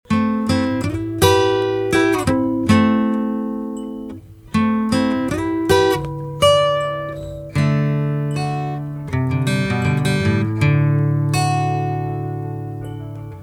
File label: lady standard guitar isolation